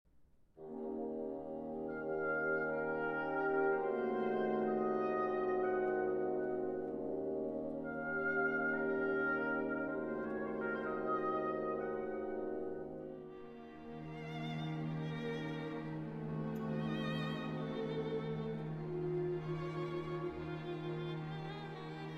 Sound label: saxophone concerto